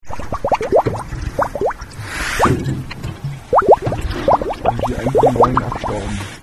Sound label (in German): blubbern; schweden 2000